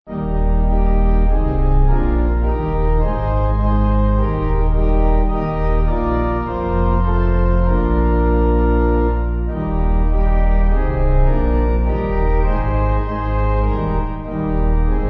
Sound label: Pipe; Come Holy Spirit Lord